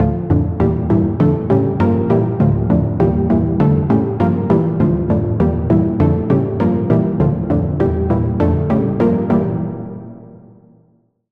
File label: Key; Routed Sound; Sylenth1 Expansion - Street Vanquisher Vol.1